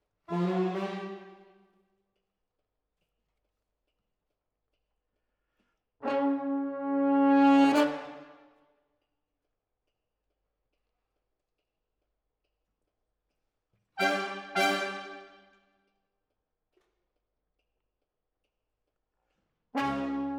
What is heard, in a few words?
Horns Over